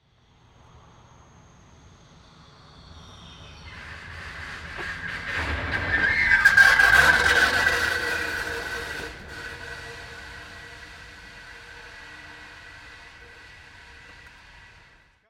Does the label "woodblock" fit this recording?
No